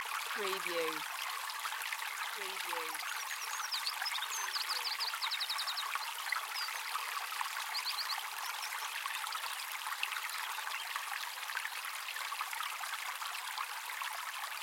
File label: Fast running welsh mountain stream with bird song; beanstalk audio